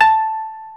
KOTO A4, Earth Tones

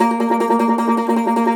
Cumbus SAZ A#, Plucked Strings; E-MU Producer Series Vol. 7 – Old World Instruments

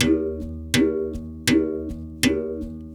Tar, World Percussion

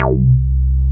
BAS, Eurotech; Sounds Good

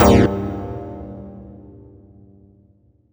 Stab C2, Club Techno; Zero-G; Stabs

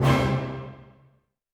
percussion, Roland TD-20

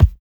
LOFI KIK; Drums In Your FACE!; BONUSKIK

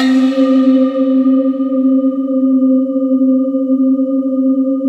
bowww C4

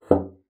BASSOON; Xsample VOL-6

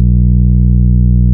TAURUS C3, synthesizer

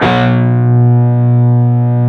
PRS PWR C3E, Guitars; PRS PWR CUTS

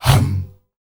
HUM HUM, World Voices, S.AFRICAN; ILIO; Vocal Planet

